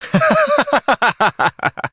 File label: laugh